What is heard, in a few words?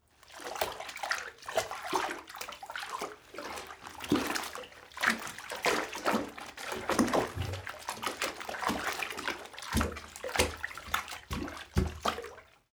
splashing mid